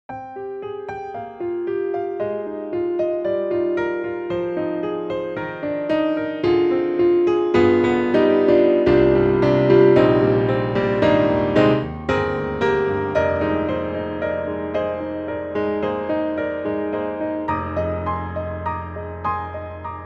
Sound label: Grand Pianos, German D, German D Recital Hall; Synthogy; IvoryII